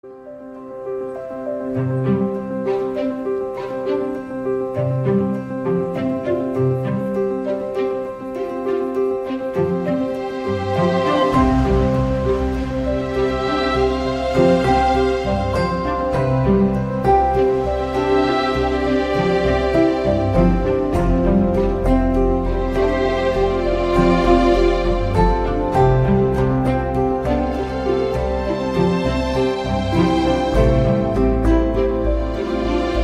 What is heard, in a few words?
Galaxy Note 20 Ultra, tono de llamada